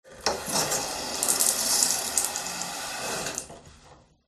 bathroom tap; fox audio

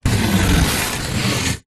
polystyrene strip thin scrape score; foley; zapsplat

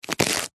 celery raw cut knife single; food

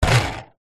table soccer game bar spin

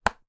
human hand slap belly

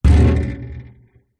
impacts metal trailer side hit knock

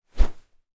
sport cricket bat swing

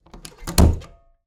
Door Bedroom Close

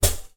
household lamp fibre optic place down

